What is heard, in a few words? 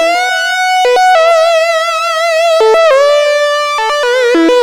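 extra lead (high sine); Sonic Foundry (Sony Creative Software); Ugly Remnants VOL-2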